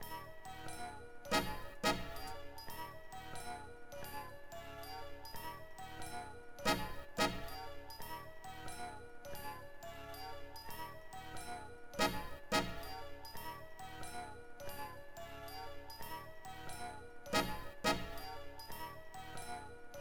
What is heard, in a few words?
Melody